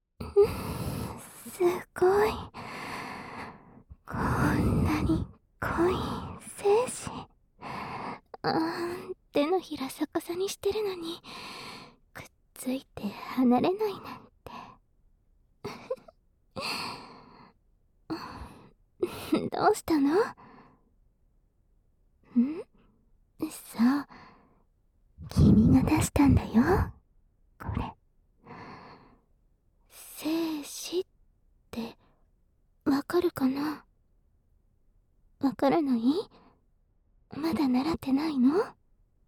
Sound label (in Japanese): 耳舐め; 耳舐めおねだり; 【耳朵开发/舔耳】把迷途小羊弟弟的性癖加以扭曲的修女的宠爱全肯定♪两耳＆乳头开发房间【乳头刺激】